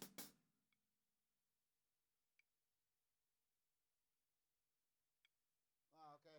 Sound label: SNR